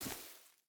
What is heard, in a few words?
footstep rubber boots grass run; Rust